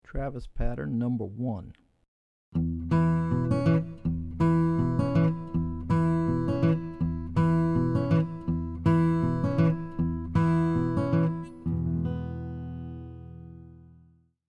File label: Travis Patterns; travis style lesson materials